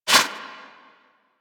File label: Tengu turbulence deflect; Warframe